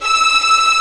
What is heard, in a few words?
Vio Trem; Multisamples; Digital Sound Factory Virtuoso